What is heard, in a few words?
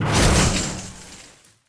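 metal shatter large; objects; Star Wars Obi-Wan; XBOX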